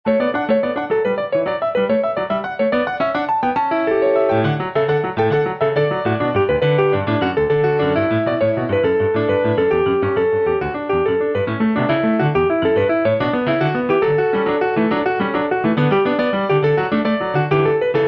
French Suite5 Gigue

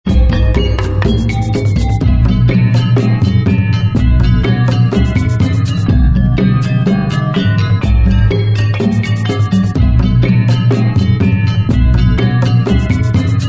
gamelan

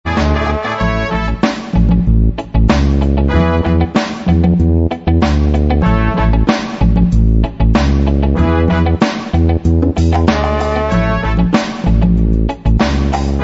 Horns

